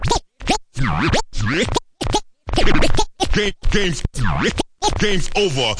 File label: turntable, Scratching and Turntablism